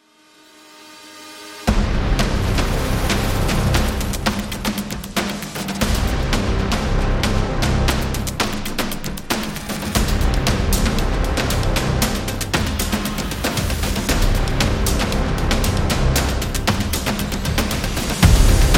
Drums Epic Hybrid; battle drums

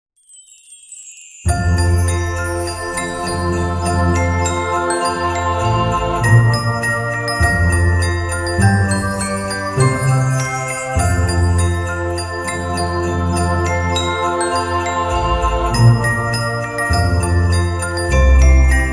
Jingle Bells; xmas

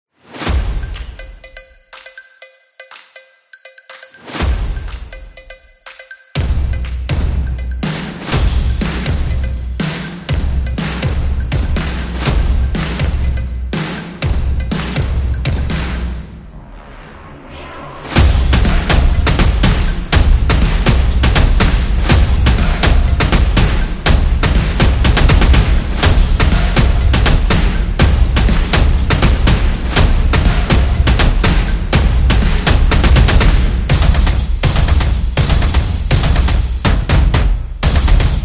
Drums Energetic; energetic drumming

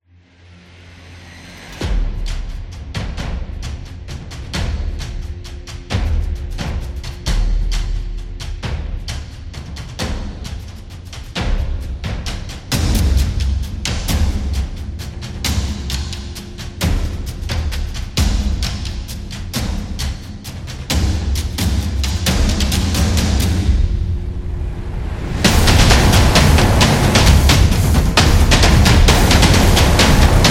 War Epic Battle Drums

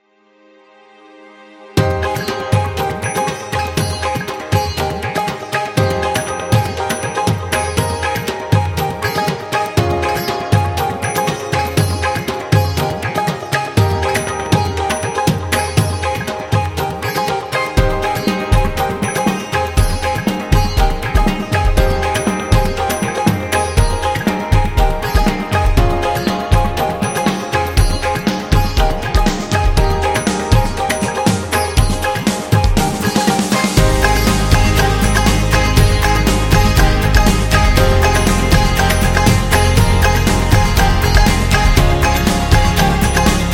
India Happy

